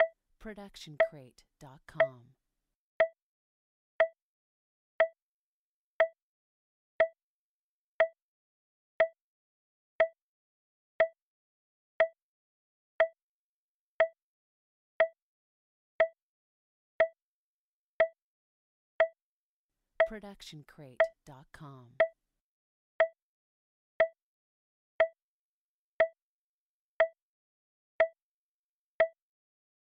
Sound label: Bomb Timer Organic Beep